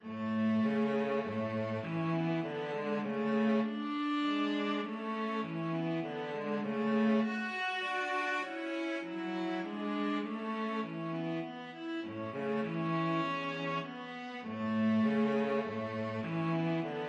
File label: viola cello duet